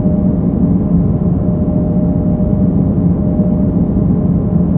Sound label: shuttlebay; trek